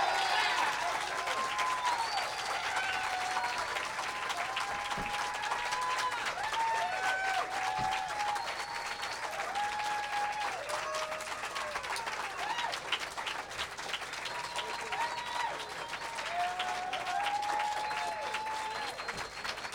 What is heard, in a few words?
applause